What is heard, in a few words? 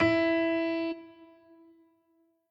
piano riff intro